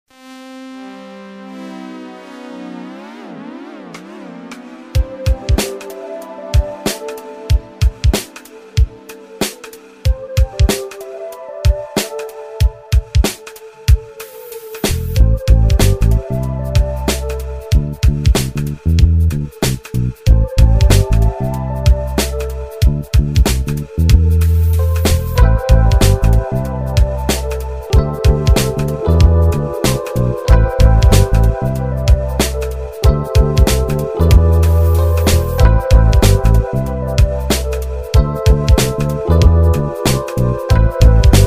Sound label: hiphop waves